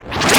Multimedia Internet CD-Rom Flash Pop Up

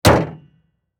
Transportation Cars Honda Civic Close Hood

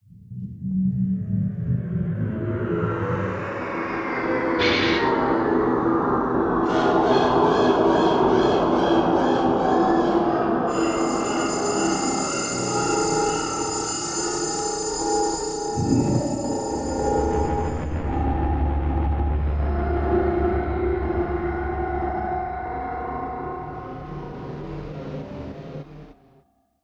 Ambient Spooky Animal Sounds; Module Production Element Title Imaging; Imaging Elements

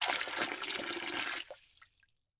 Liquid Water Water Pour Plastic Cup Into Pool

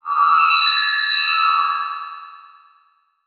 Title Transition Feedback Pulse; Production Element

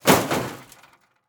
Crash Concrete Falling On Car Hood; crashes; AdobeCC

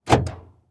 Truck Ford Ranger Lock Door Interior; transportation

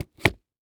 Foley Footstep Work Boots Concrete Single Step; Foley Footsteps

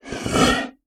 Animal Mammal Herbivore Donkey Mule Snort; animals; AdobeCC

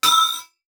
Multimedia Internet CD-Rom Flash Buttons Short

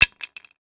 Industry Hatchet Dropping On Cement

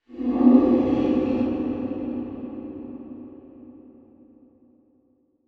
Title Transition Ghostly; Adobe; Production Element

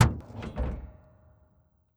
Auto Buick Open Hood; Transportation

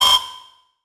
Multimedia Internet CD-Rom Flash Beep Mechanical

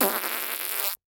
Human Male Spits Raspberry

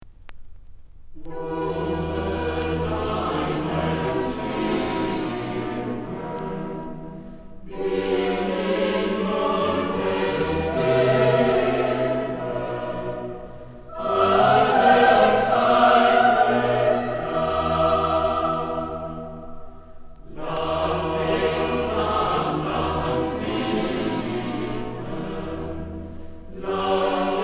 Motet; BWV 227 III; Bachchor Stockholm; Bach 6 Motets

